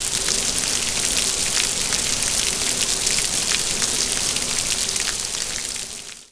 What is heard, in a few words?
hydrant sprinkle; Call of Duty Modern Warfare